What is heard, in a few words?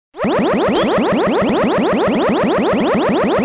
laser; Isaac Asimov's The Ultimate Robot (1994)(Microsoft Home)